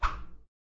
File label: scratchcard reveal